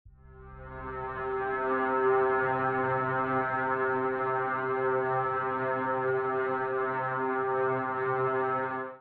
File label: Drones